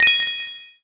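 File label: System Pay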